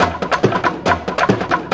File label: 140 tribal spirit, drum loops and breaks